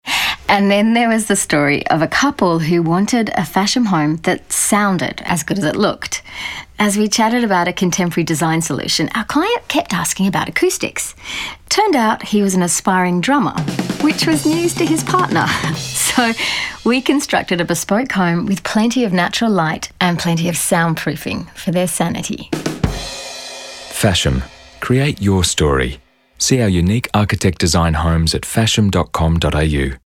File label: Drums